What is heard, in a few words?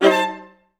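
violas A3; Best Service; Peter Siedlaczek's Advanced Orchestra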